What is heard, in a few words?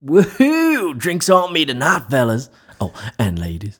wohoo; Pitch Black Audio Pong